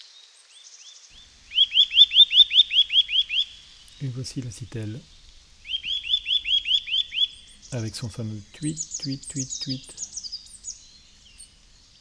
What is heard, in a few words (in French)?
sitelle commentee